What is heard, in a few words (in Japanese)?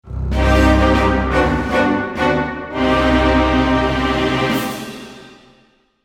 不穏なファンファーレ